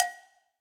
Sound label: Cowbell Mid Cold Tight Room; Drums; Drum Hits; Standard Hits; Ableton; Factory Packs; Session Drums Studio